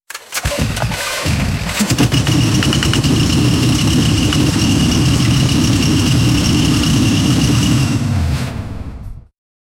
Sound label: 650 hp engine startup ign; starter